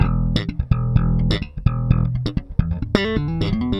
BASS X

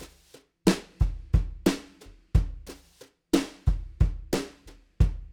Drums, Jazz

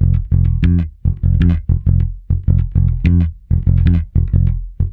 Bass F Funk

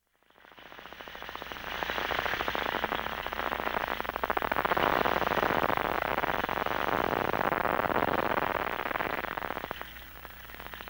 Sounds of Nature - Humpback Whales

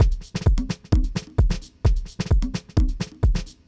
jazzy 130

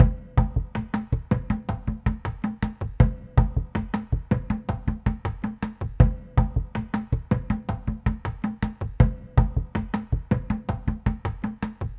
JUNKYARD RHYTHMS

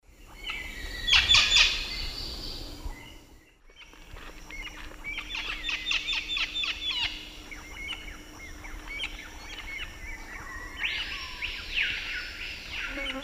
Deroptyus accipitrinus, flight calls; S. America; Eastern Lowlands

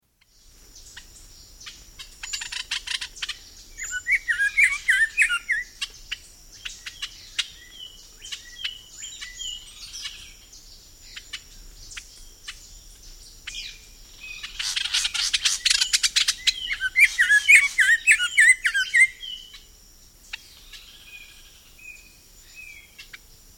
Red-throated Ant-Tanager; S. America

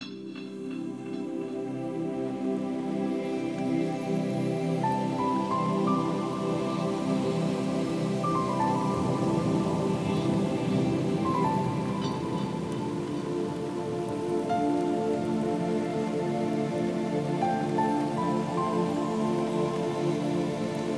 Zen Natural White Noise; New Age; Meditations; Deep Meditation; Healing Sounds of Nature

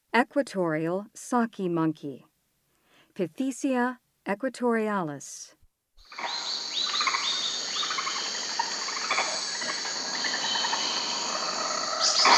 Equatorial saki monkey; S. America; Rainforest

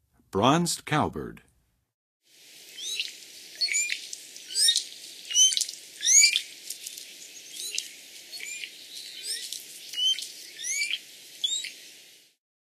Bronzed Cowbird, Songs of Birds of North America; Western USA; Western USA Songbirds

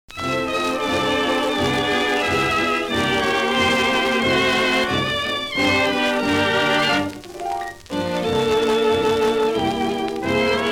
Orchestra, instrumental; Popular Jazz Archive 1920-1936

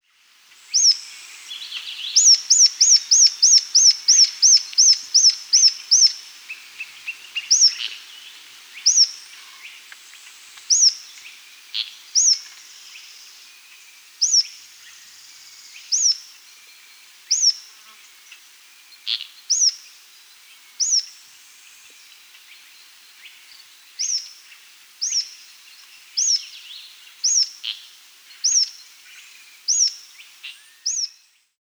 Rough-Legged Tyrannulet (Phyllomyias; S. America

